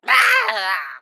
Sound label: Pecheneg Gray Death; Folk Hero